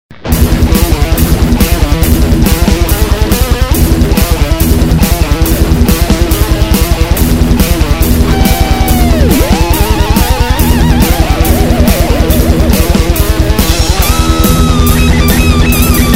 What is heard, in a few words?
instrumental